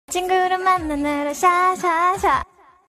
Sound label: notification ringtone